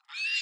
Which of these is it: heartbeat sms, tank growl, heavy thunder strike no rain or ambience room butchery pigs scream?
ambience room butchery pigs scream